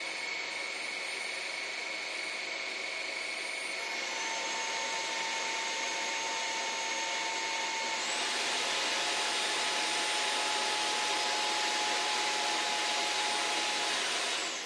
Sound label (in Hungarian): jv85 zaj, vezetéknélküli porszívó; jimmy; JIMMY JV63 vezetéknélküli porszívó